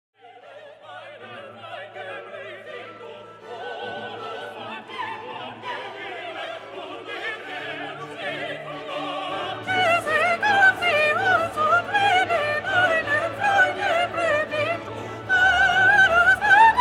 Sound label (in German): Kusse gab SOP